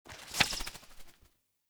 UI Quest Journal Open

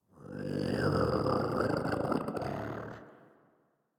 Catbrow Combat Vocal Idle; Warframe

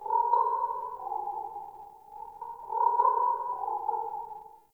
Cave Creature; Ambience; Caves; Glades Of Andurin

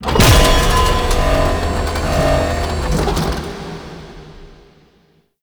Galleon Platform Long Start; Warframe; Grineer Galleon; Vor Galleon Fight

